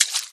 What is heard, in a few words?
footstep water; Scrat